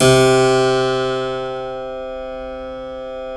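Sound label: C2 clavinet left, Keyboards Acoustic; USB Soundscan vol.17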